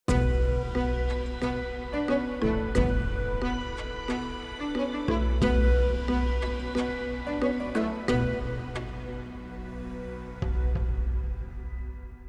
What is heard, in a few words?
Sting Jungle Morning